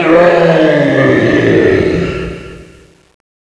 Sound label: zombie death